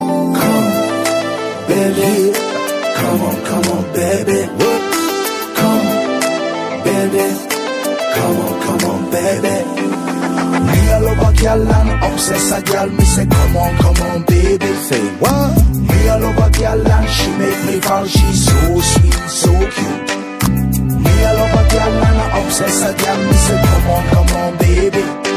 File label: bengali ringtone